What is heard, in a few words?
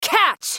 spell cast effort, en US; Sivir